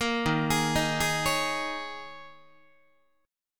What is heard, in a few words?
Eb 7th Suspended 4th Eb, Guitar; 13,11,11,13,12,12; 4A,4E,5A,5D,5G#,6C#; arpeggiated